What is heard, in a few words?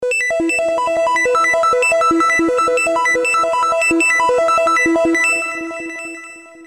synth, Roland JV2080